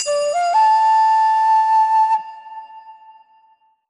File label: Upgrade Jingle; Avatar The Last Airbender The Burning Earth; ps2 wii xbox 360